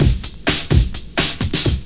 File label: oldskool break, drum loops and breaks